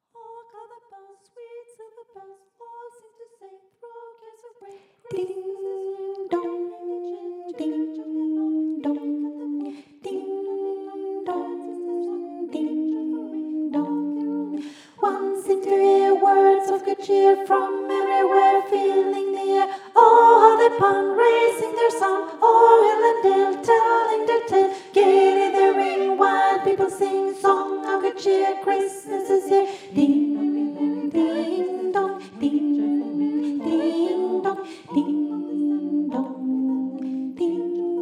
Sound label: Alto; Carol of the bells